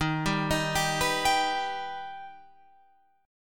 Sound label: Eb Augmented, Guitar Open F; arpeggiated